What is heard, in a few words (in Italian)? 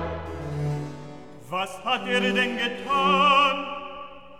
Recitativo; Was hat er denn getan; Handel; Brockes Passion